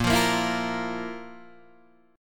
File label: F Major 7th Suspended 4th Bb